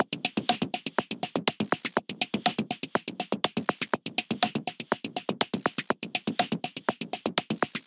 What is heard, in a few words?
122 BPM Micro Drums; percussion essentials 1